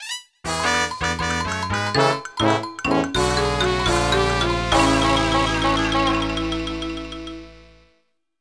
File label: Game Over Theme; SMS